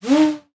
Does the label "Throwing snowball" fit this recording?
Yes